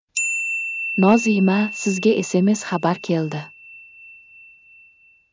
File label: sms